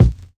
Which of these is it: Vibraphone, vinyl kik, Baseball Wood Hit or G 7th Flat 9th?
vinyl kik